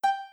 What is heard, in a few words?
success